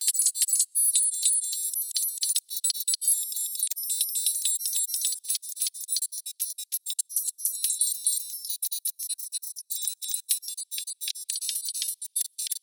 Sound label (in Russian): klaviatura nazhav virtualnij zvuk